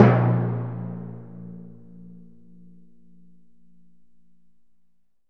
Orchestral Perc, Ambo Timpani; Roland LCDP03

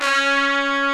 trumpet C#4; TRUMPETMIX

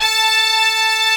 BRASS A4; BRASS#1

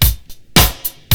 BEAT, BREAKBEATS; Crypton - DrumBase5000

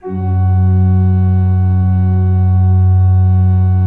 F1, Cathedral Organ; ILIO; Propeller Islands Cathedral Organ

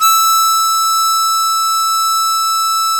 HARMONICA

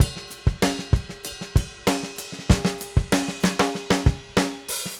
Drums Fill, 70s Analog Funk; Sonic Foundry (Sony Creative Software); What it Is!; Slinky's Place

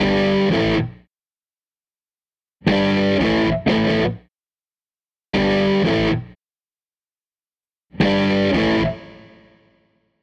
Les Guitar Loop

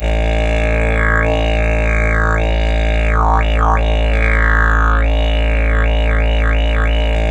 A drone simple, Didgeridoo; Big Fish Audio; Didgeridoo and Other Primitive Instruments

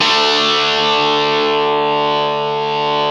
GTR Crunch, Dirty; Club-50 - Foundations Roland

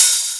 Open Hihat; Best Service; House Definition VOL-1; Cymbal Stuff

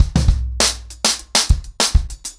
100BPM, ACOUSTIC DRUMS

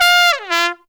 TRUMPET FX; Zero-G; Phantom Horns

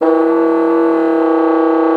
MULTI, bassoon; Xsample VOL-6

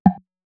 Snares, Alesis Performance Pad

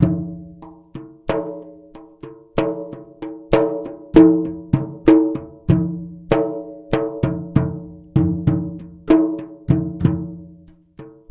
MLY DRMS; Spectrasonics - Heart of Asia CD2

